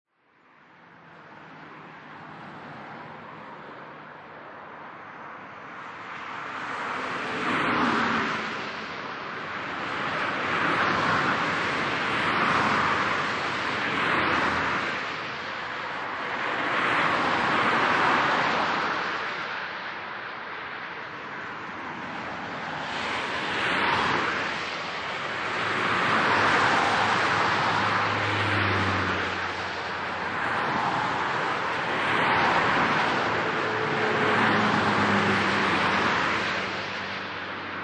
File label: Highway Traffic Long Sound; continuous highway, freeway or motorway noise; Evenly flowing traffic; Traffic And Car; Lynne Music